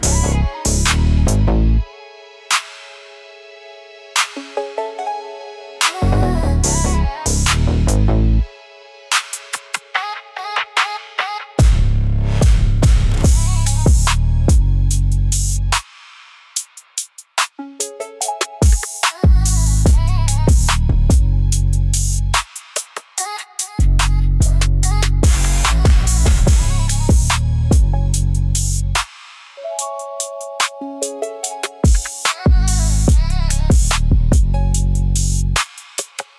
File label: New Rap Trap Hip Hop